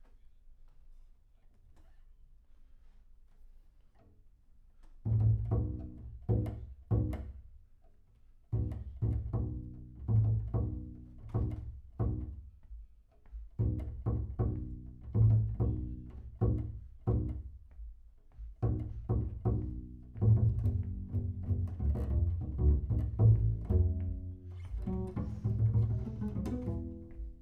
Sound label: Bass Room